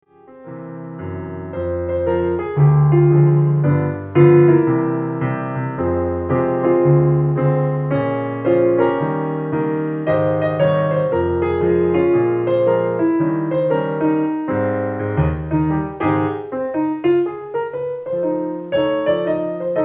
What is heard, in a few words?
Bar Piano